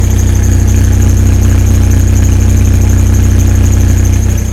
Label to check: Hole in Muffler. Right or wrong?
Right